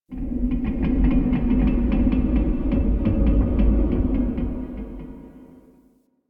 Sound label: Metal Creak Medium; Ambience; Warframe; The New War; Quests; Zariman